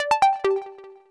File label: Offline Message